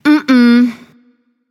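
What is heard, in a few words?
disagree, female; smite; voicepack; hera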